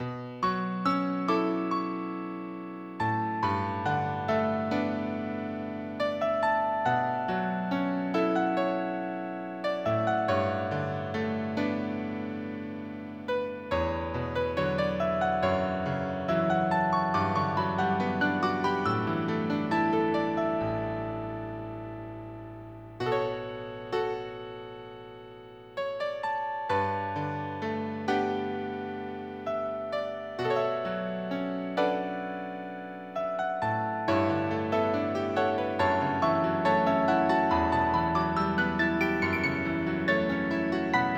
Town Sad MIDI; One Way Heroics Plus

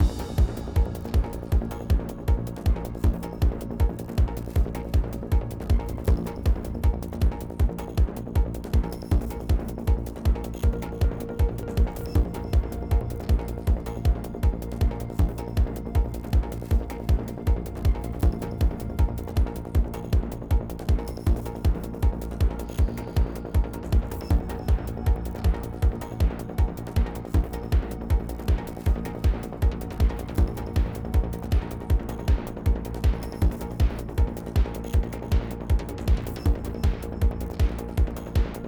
Family Chase 8CH 158BPM

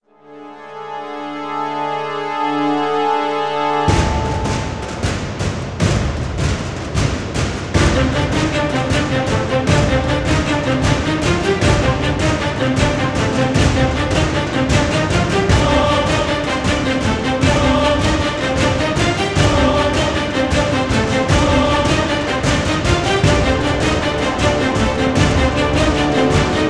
Boss Dungeon; Fall of Light

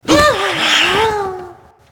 Ghost Defeated

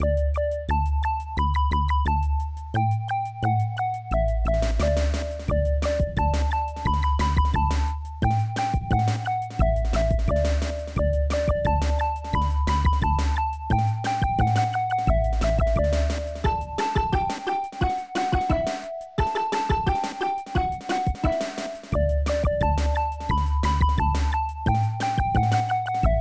Baa Baa Blacksheep; Tap-A-Jam; Windows gamerip 2003

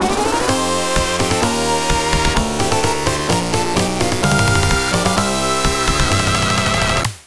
Stage Clear; Rocket Knight Adventures; Genesis

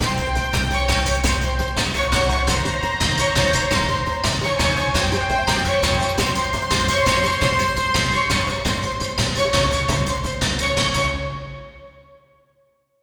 boss coastal enrage, 170 7 8; rogues like us

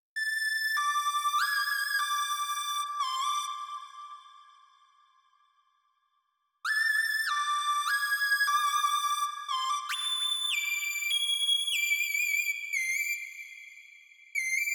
Frantic Mix Stem Loop Layer; Atari

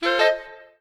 Squeezebox Riff; Levels Hub Bayou; Music Riverboat

